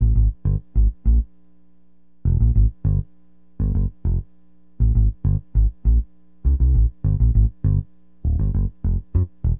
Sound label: BASS, HipHop; LiveAct Pool 1